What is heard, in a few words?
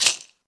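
FX shaker; AKAI S6000 CD-ROM - Volume 5; PERCUSSION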